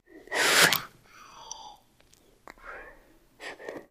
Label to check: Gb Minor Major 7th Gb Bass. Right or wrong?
Wrong